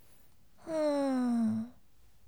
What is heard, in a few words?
"umm"